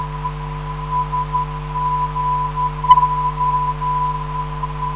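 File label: 356kHz, non-directional beacon; SGO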